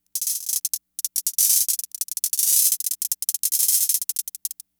FX, Drum Machines; Fricke Schlagzwerg

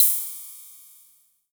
Vermona DRM2 CYM

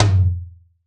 TR-707 Tom 6, Roland BK-5 Toms

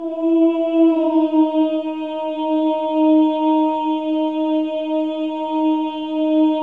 Voices

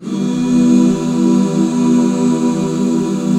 E MIN; Best Service Gigapack 1

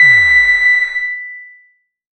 FX, EMS VCS3

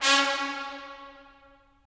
BRS, Tps Stacc; Roland - LA Composer vol. 4

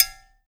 COWBELL, METALS; Spectrasonics; Liquid Grooves 1 & 2

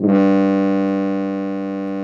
FR HORN G1, French horns; Greytsounds VOL-1

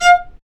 Vc Marcato; Roland L-CD702; Vc Marc&Harm; Vc Marcato 1

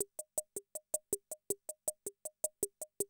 CR78, DrumLoops

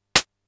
closed hh, Polivoks

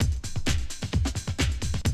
130, Classic DrumLoops

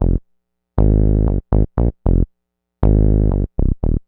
Funk Bass (F), Synth Bass Loops; Sonic Foundry (Sony Creative Software); Groove Elements

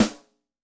Beaty Rim, Yamaha DTXpress-II snare

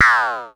Perc Synth Raw

